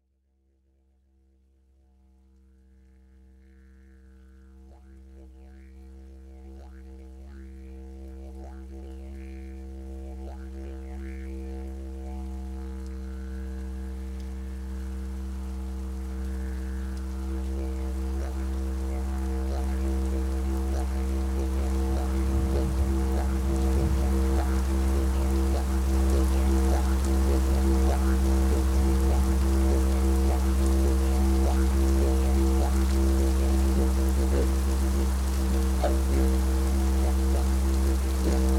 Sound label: Ambient Didgeridoo; Meditation